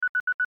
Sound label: Zoom In, System sounds; LG GT350i Wink Plus